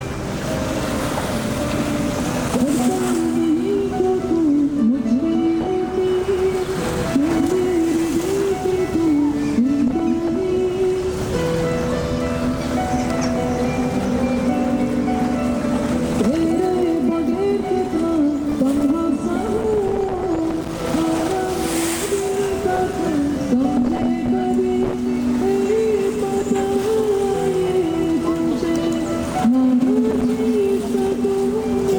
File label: besiktas seaside wind and singer